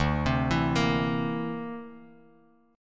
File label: D 7th Suspended 2nd Sharp 5th D, Bass Standard; D7sus2#5 arpeggiated; JGuitar